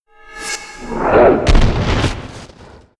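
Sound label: entiwood attack; monster; Senillinea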